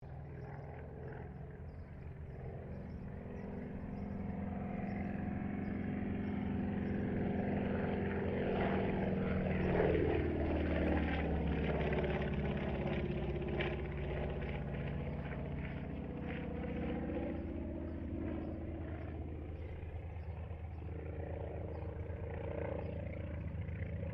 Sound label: AIRCRAFT CAC WIRRAWAY PASS DISTANT STEREO